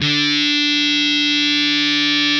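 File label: fuzz, fuzz guitar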